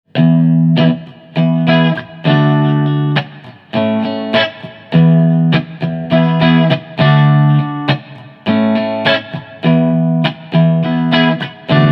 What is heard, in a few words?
strat modern clean